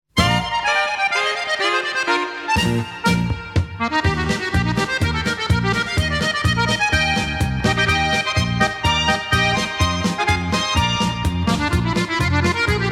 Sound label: vagabunden polka